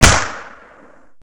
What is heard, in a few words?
snip; Mission Terror